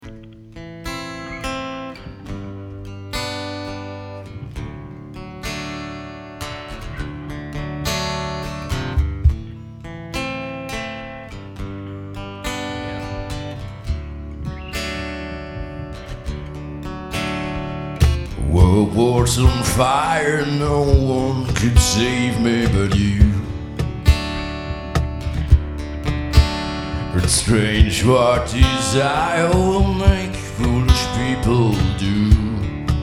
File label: acoustic